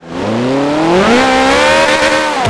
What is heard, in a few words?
first, vehicles; ferrari 458 risi